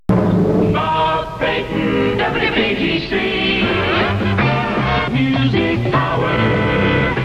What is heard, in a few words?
jock jingle